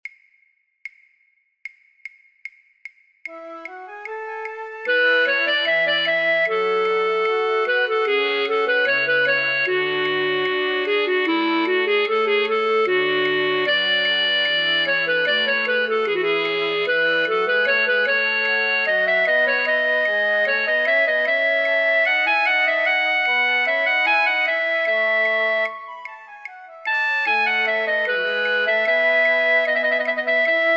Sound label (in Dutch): Ritournelle flute choir voor oefentracks fluit; Rameau; Platee